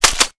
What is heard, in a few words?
bolt pull, weapons